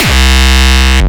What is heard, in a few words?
Speedcore Kick